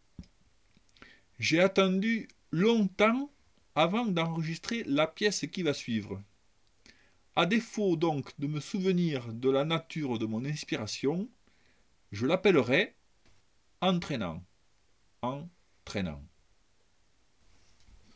en trainant

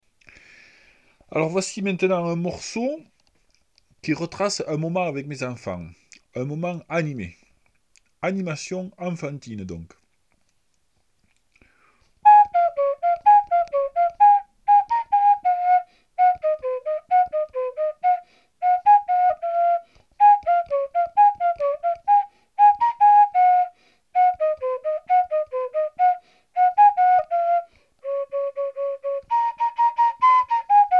animation enfantine

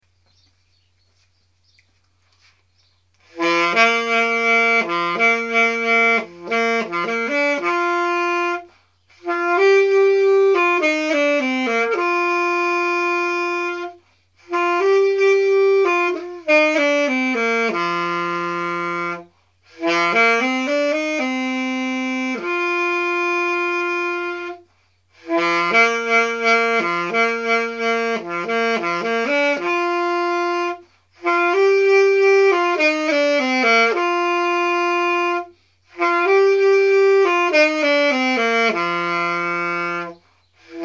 grelons saxo

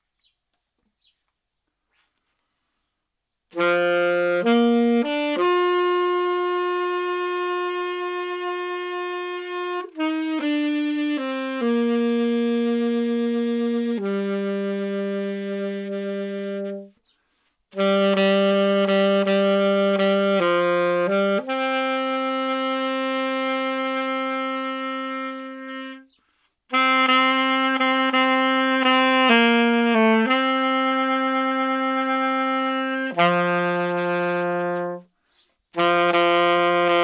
tendrement sax

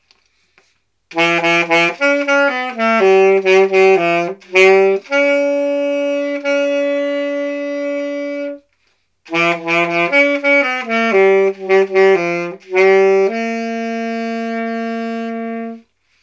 isolement saxo

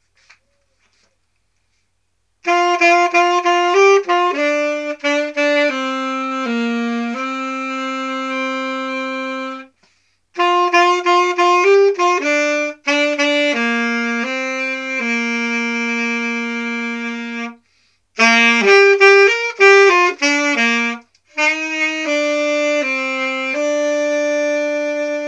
repos strategique saxo

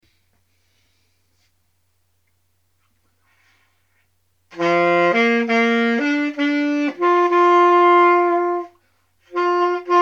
apesanteur saxo

